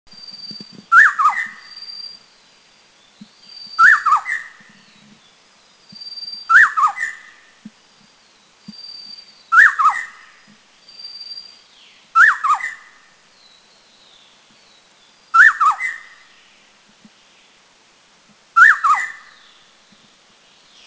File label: Spot-breasted Scimitar Babbler, Taiwan